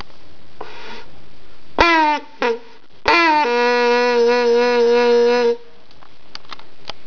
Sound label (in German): Horn; Harztour 2006